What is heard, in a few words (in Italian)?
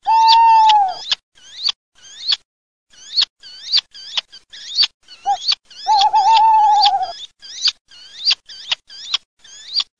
ALLOCCO CIVETTA